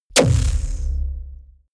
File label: sci-fi plasma cannon fire deep space; schusswaffen